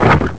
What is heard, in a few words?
Barrel Unhinge; The giant barrel in Deepwood Shrine becoming unhinged (one side then the other)